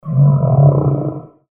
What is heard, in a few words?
humpback whale